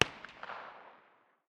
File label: smg 9 far distance; tacz-tweak-pack; taczmechanics